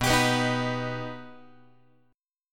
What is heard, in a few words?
B Augmented B down Guitar; strummed; JGuitar